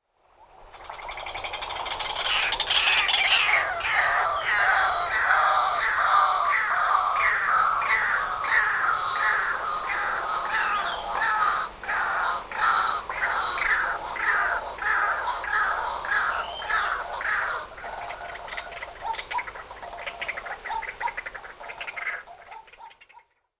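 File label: White-breasted Waterhen, okinawa; シロハラクイナ; 喧嘩; 沖縄県西表島; 梶本恭子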